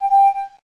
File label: Water Pulse